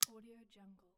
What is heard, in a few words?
plastic light switch